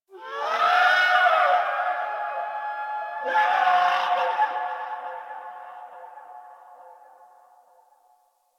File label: Distant Zone One Creature